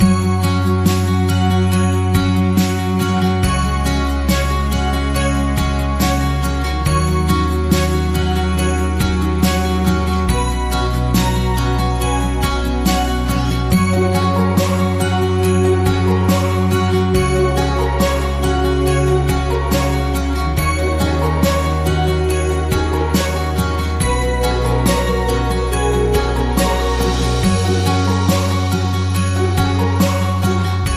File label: Joyful Acoustic Christmas Loop